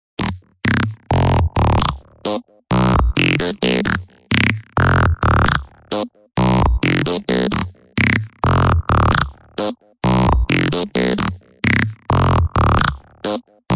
sinus infection bass, bass loops

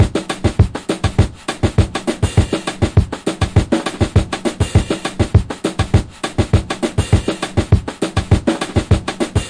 brush beat brazil, 101 bpm, drum loop; 101 to 110 bpm

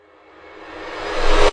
dark rev cym, cymbals; single hits

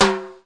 hi timbale, Western and Latin Percussion; single hits

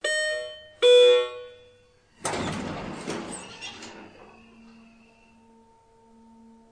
loud elevator ping pong and door; Sound FX Real Life

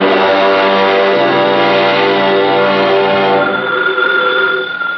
oldtime suspense; ORCHESTRAL and CLASSICAL

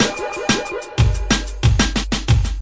straight atari, 184 bpm, drum loops and breaks

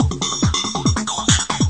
140 phasing lofi groove, drum loops and breaks

